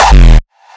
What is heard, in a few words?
Raw Kick, hardstyle; raw hardstyle kick pack 1